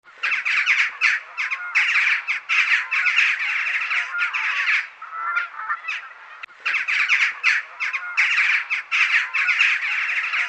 Corvus monedula

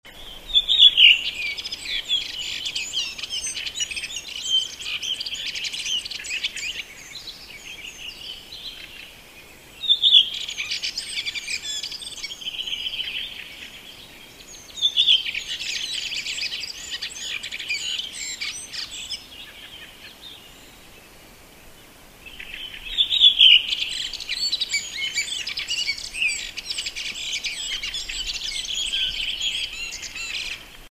Turdus iliacus

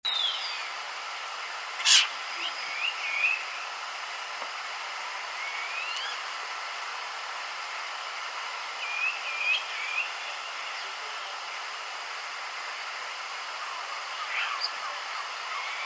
Sturnus vulgaris

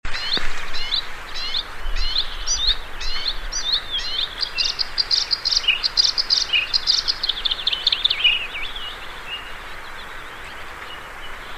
Luscinia svecica

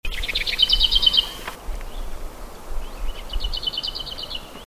Sylvia curruca

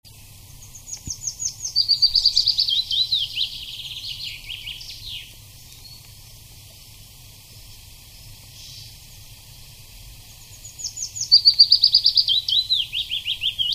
Phylloscopus trochilus

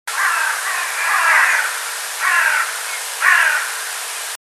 Corvus corone

